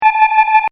Scifi SFX Loop, Short; Sci-Fi (Sound Effects:Science Fiction); Stuart Duffield

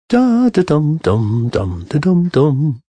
Sing Dum Dum, Male; Singing Sound Effects

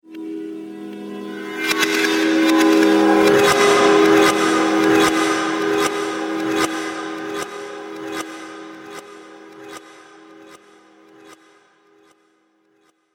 Odd Magical Ambience, scary soundscape

